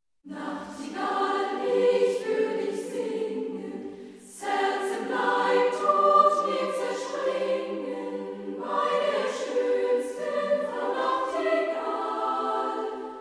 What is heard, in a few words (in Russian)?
юношеский хор; Frau Nachtigall